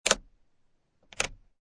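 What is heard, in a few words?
Car Interior Dash Light Switch On Off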